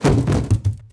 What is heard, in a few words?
bodyfall metal